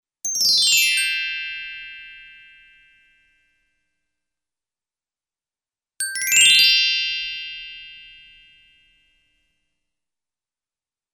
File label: Descending - Ascending Gliss (glockenspiel), Cartoon; Hollywood Edge; Cartoon Trax